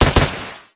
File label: Death fire; GunCrasher